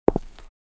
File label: footstep on concrete